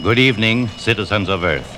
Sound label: VOX GENERAL; AMG Megabass REMIX! VOL-2